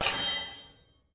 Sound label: Play Clicked; Golf Blitz 1.12